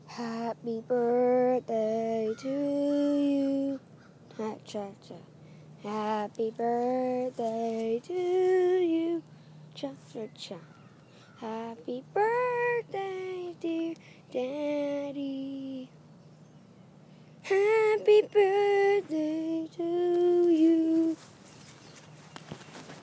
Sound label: enthusiastic birthday song